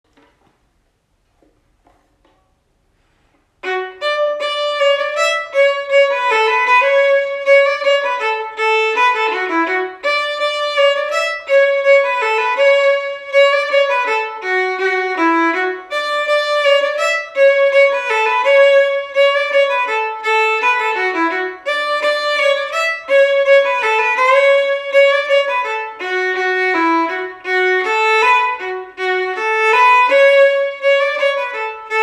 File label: The Wren lower harmony